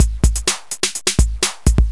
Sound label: D-Kit